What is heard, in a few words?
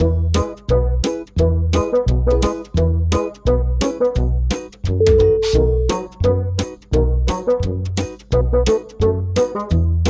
comical easy bassoon